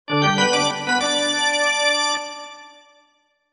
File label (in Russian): zvuk organa na futbole chistyj; Звуки игры в футбол; Звуки футбола